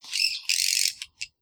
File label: bat